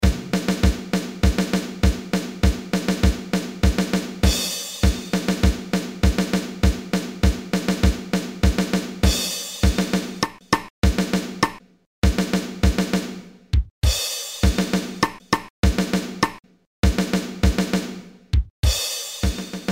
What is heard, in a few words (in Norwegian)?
Lakkegata trommemarsj